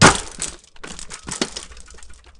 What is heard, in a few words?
fail; Matt Hoffman's Pro BMX 2; XBOX